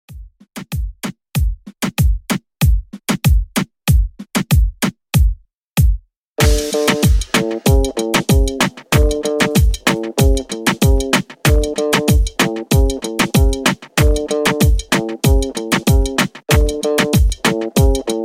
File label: Afro Reggaeton 95Bpm